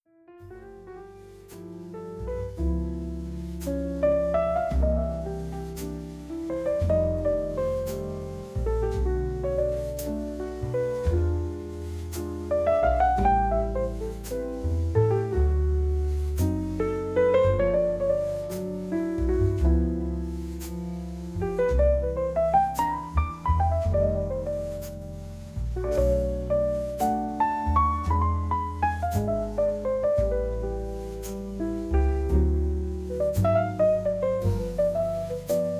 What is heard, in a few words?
Late Night Jazz Lounge Romantic Jazz